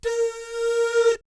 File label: DOO SFZ, Jazz